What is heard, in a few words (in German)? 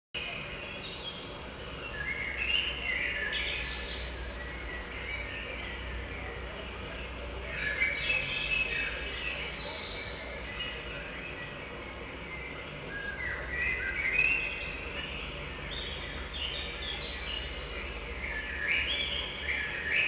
zwitscher klo